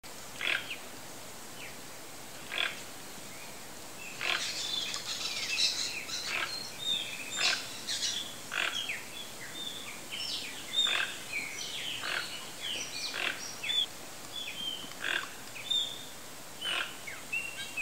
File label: bird sounds